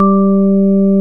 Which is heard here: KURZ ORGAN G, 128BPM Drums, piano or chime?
KURZ ORGAN G